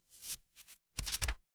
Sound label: paper fabric page turn